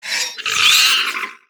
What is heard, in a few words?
cathedral gargoyle death